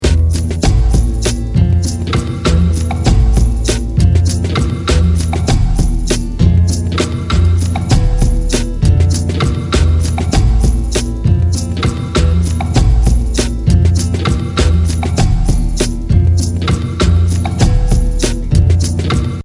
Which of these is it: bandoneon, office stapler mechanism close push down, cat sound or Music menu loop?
bandoneon